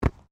SOCCER BALL HANDLING

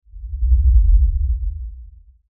Lfe Rumble By Swell Low Bass Movement; Rumbles; Blastwave; Sound Effects:Sound Design

